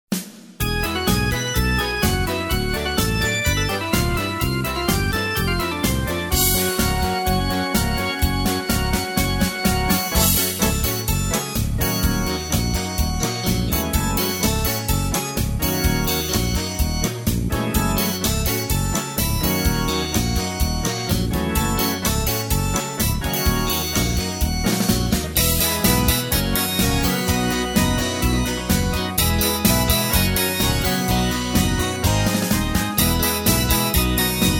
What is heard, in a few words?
music